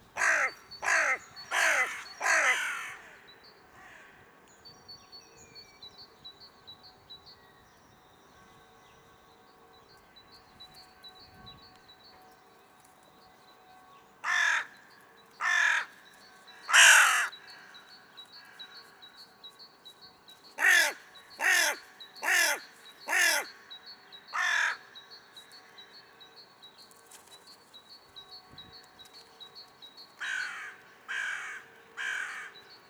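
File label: hooded crow corvus cornix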